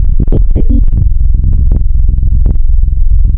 AKWP epiano, wavetable; Adventure Kid

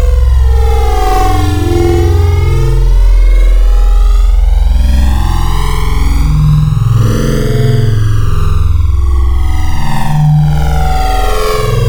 Hyper, Wavetables

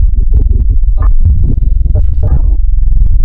ebass; Adventure Kid

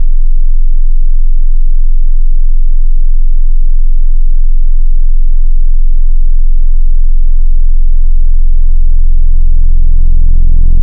Dist Tube, wavetable

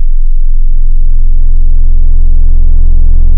Dist Hard Clipping, wavetable

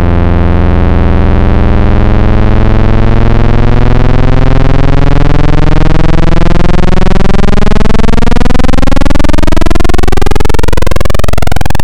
Analog PWM, wavetable

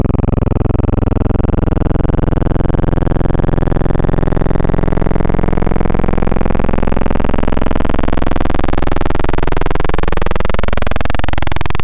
Analog PWM Custom

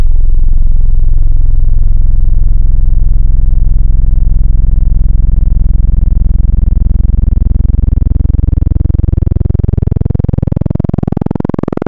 Analog PWM Sub, wavetable

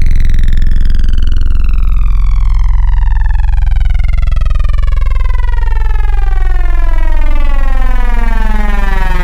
Growl, Wavetables

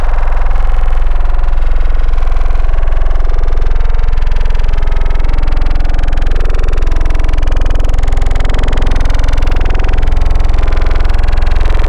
Growl, Wavetables

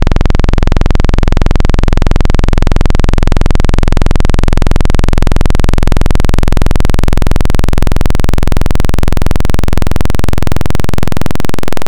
Analog PWM Saw

